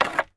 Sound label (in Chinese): 插木牌与阅读木牌